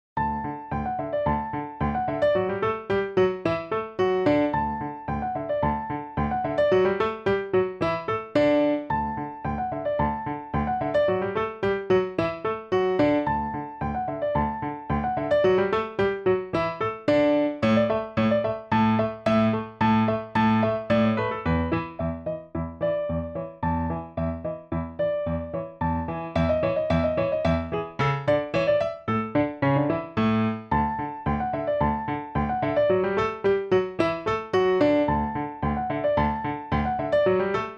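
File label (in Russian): милая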